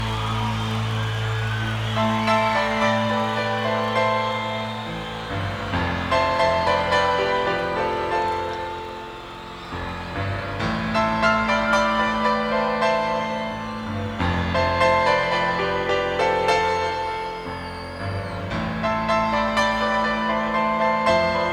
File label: Piano Solo